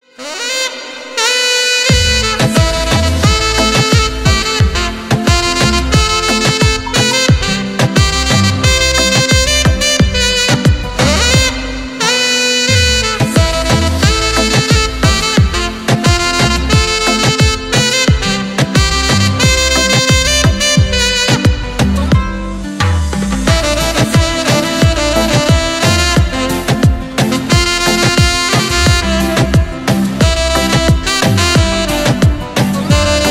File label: saxophone version